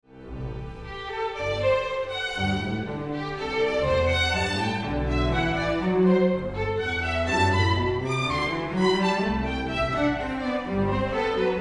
Celebration Fanfare; ORCHESTRAL WORKS

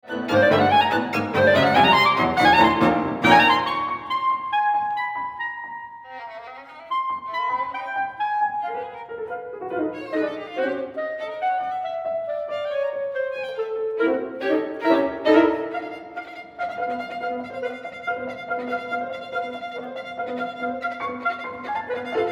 Dash Cl Vln Pn; CHAMBER WORKS

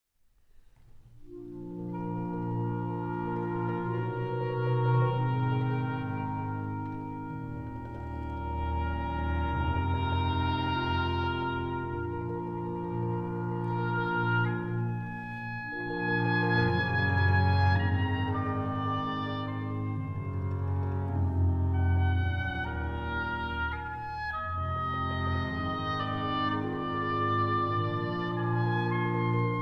Oboe Concerto Band Vers; wind ensemble works

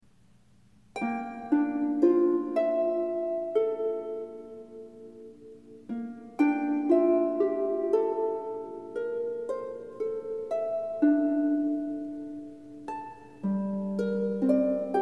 music box of light; chamber works

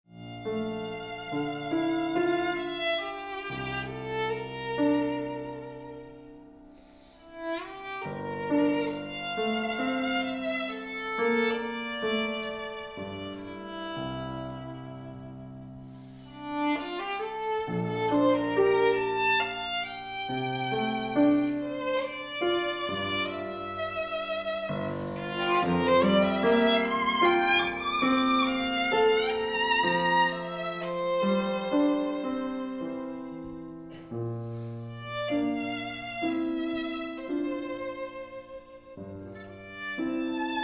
Legacy Vln Pn; CHAMBER WORKS

